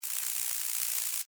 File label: Insect Wings Fly, Steady, Bug; Animal; Wing Sound Effects; Animals